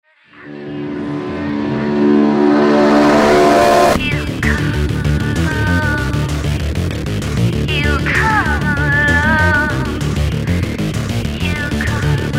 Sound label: distort